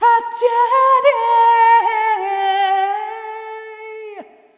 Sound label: Arrhythmic F